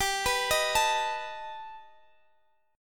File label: B Augmented 7th G, chord